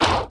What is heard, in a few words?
Noise Blurt; lego my style preschool